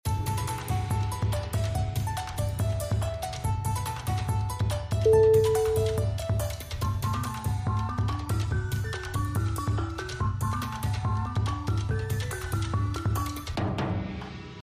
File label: music cheerful; bgm